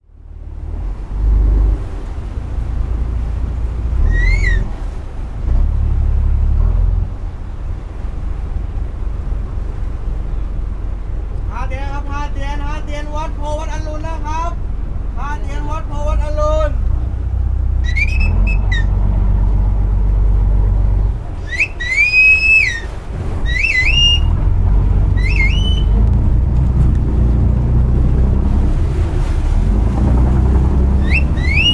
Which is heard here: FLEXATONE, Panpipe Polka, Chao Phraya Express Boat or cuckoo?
Chao Phraya Express Boat